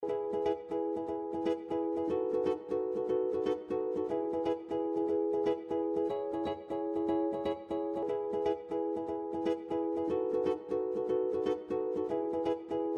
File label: Mandolin